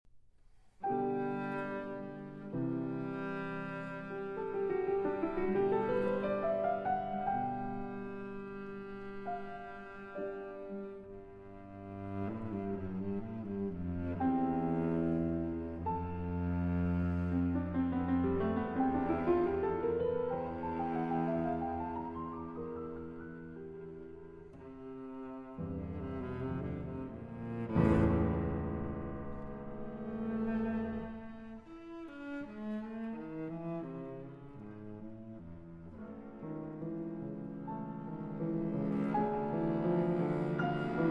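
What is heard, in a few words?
Sonata in C Major, Adagio - Allegro Vivace; No. 4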